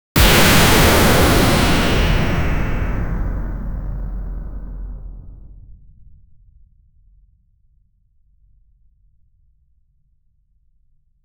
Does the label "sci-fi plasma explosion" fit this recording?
Yes